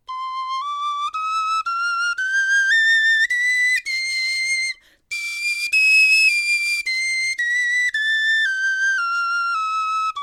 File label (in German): tonleiter, knochenfloeten